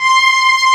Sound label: STRINX C5; Analogon